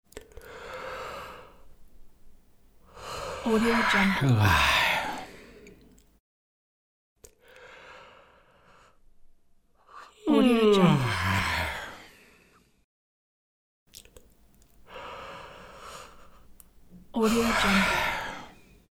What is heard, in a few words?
yawning